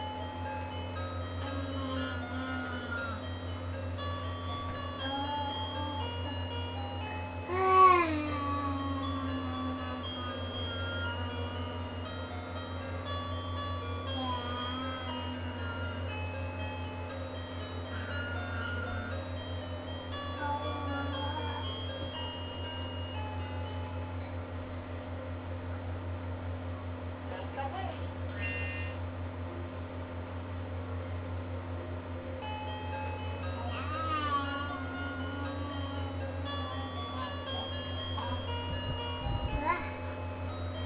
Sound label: sing along